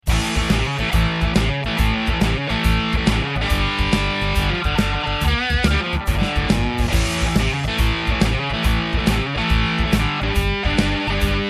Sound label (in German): fuzz voll auf; MEK Classic Fuzz CF-1